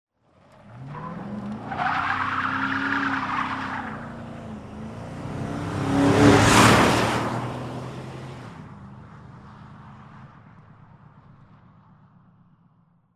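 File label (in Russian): машину заносит и она проносится мимо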